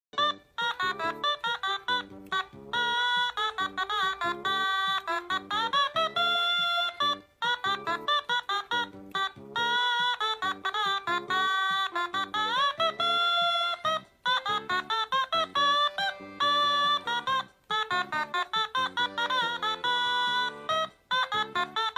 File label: Otamatone